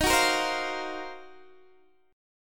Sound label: D sharp Minor 6th Add 9th D sharp Guitar DADGAD, chord